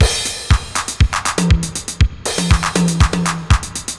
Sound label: Spring Verb Compressed TR 505 120BPM